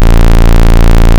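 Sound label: Ob Saw Square, Synths; Multisamples; Ableton Live 12 Lite; Core Library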